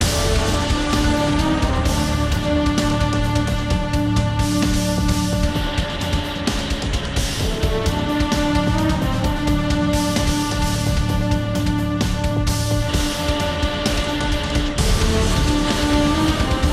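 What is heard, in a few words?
Music Bunker Battle Loop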